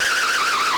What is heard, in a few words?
spin out; SNES; SMK